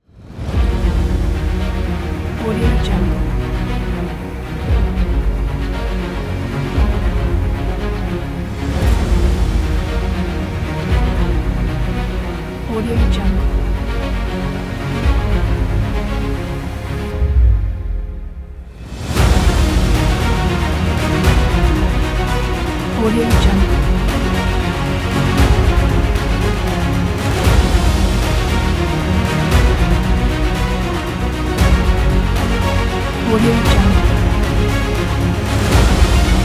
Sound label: historical dramatic cinematic